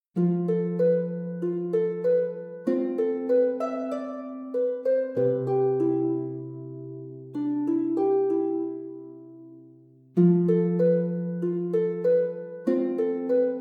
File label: Easy Harp Arrangement; The Legend of Zelda: Ocarina of Time; Arranged for Easy Harp with Chords; Sophie Sauveterre